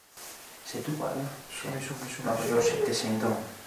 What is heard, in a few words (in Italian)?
Respiro sospiro pezzo intero; Bogoni